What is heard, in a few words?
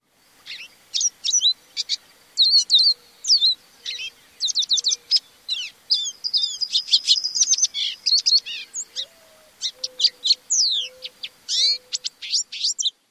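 Lesser Goldfinch extended song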